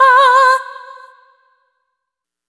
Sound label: Stigmata, vocal samples; Sonic Foundry (Sony Creative Software) - Pocket Diva World Class Vocal Samples; Op-Erratic Loops